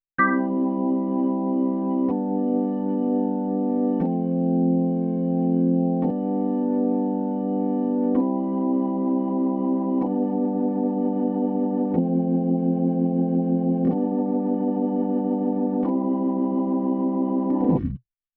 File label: Organ Rotary 12 Uhr On Off